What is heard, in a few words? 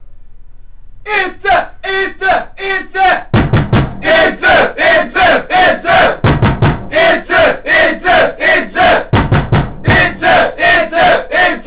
call